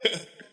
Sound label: "huh"